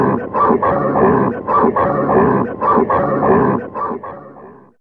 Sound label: Pigs; Fairlight CMI IIe